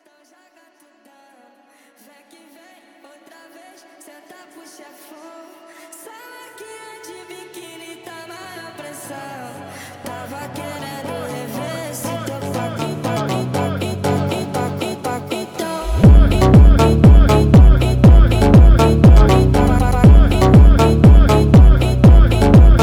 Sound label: phonk